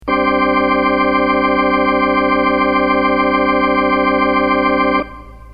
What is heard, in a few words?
organ c3, scanner vibrato